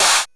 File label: Drums, Hits; DCM8